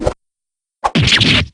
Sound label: stab; anna